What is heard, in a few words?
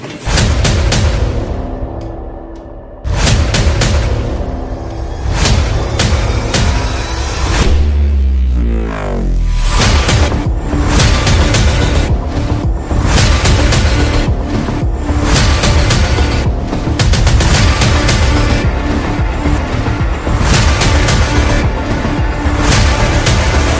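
background music Ringtone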